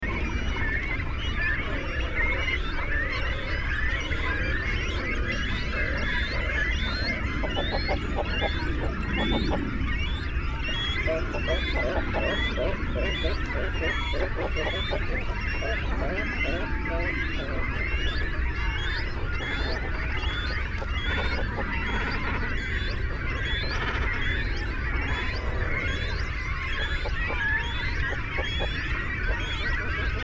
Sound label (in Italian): Cormorano